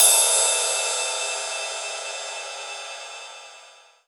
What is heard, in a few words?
Paiste, ALL DRUMS; Best Service; Alpine Volksmusik